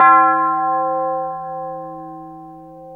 TUBELL LO, TUBULR; GONGS+TUBULR

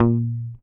HOFNER MUTE, Bass

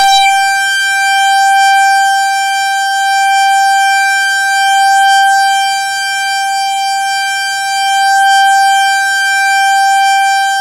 EDGELEAD; MORPHEUS

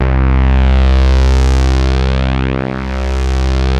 PULZE SWEEP, BASSES; Masterbits; Vintage Voltage

